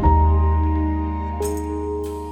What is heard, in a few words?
Piano Pad